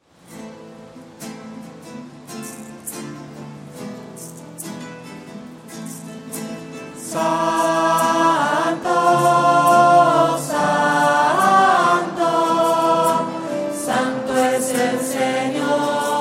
santo lento y triste, voces

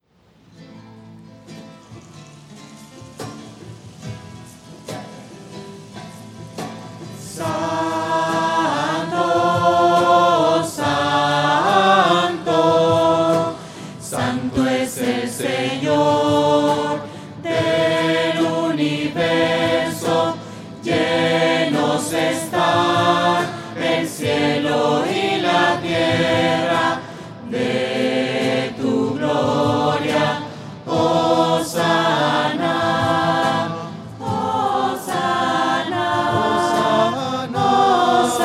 santo lento y triste, voces; misa

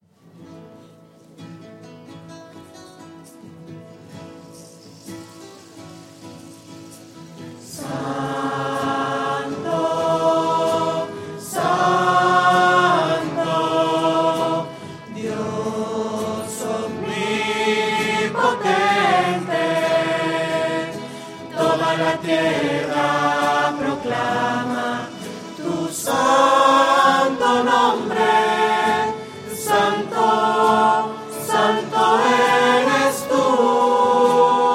santo pacto voces

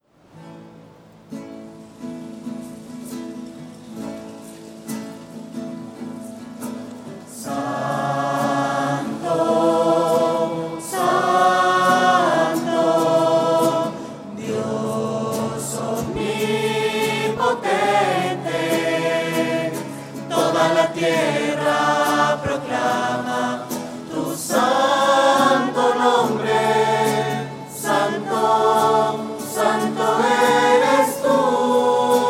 santo pacto voces

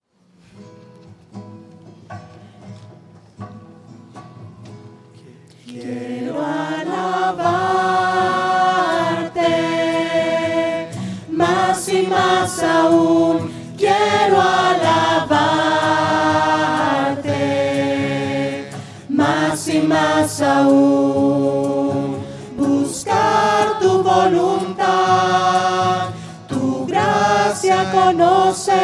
mas y mas aun voces; misa

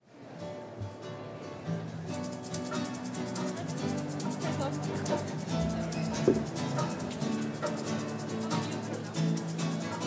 voces; misas 2012

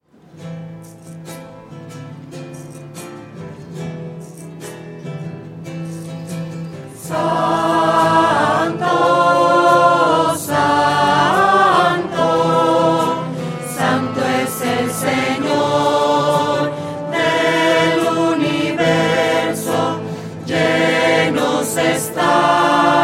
santo lento y triste, voces

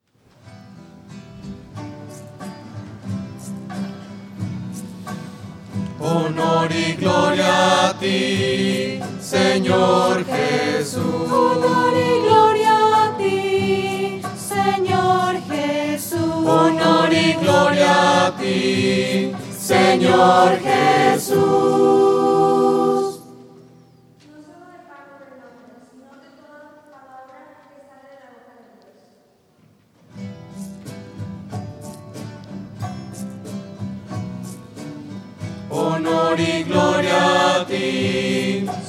voces; misa

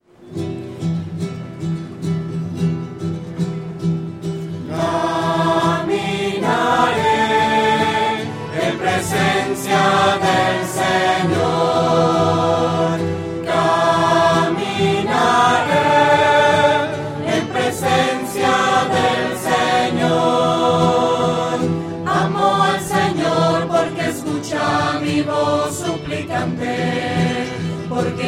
caminaré voces

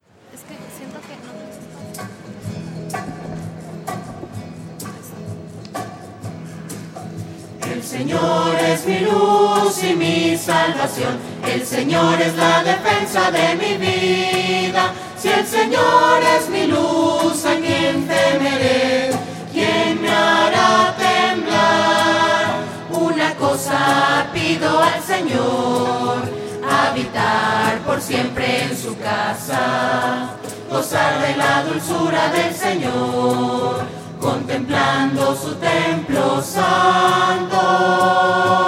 voces; misa

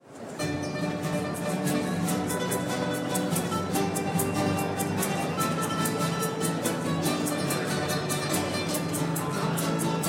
voces; misas 2012

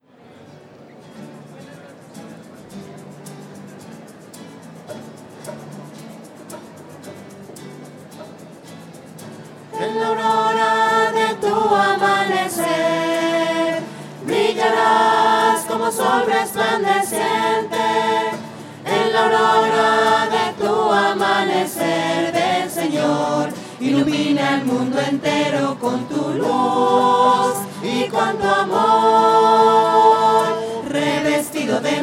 voces; misa

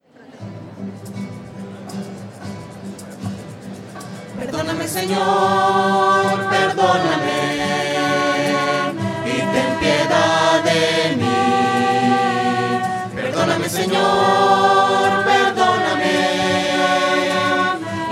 voces; perdoname sennor; misa

